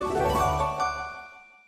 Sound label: Question Correct Sound